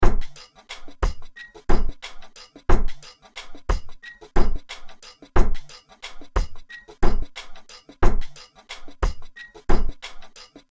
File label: KALIMBA